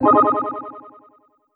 xtra life

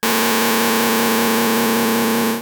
Hum; RetroGamesSoundFX

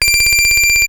Ringing, Retro Games Sound FX

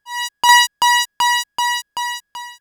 Alert, Retro Games Sound FX

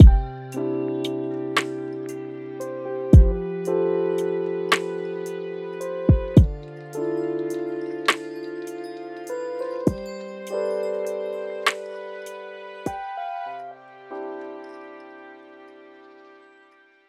End Stinger, Kawaii Love Lofi Music; Kawaii Love Lofi Music Pack 2

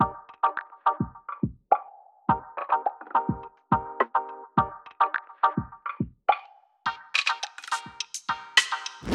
Intro Stinger, Kawaii Love Lofi Music; Kawaii Love Lofi Music Pack 2

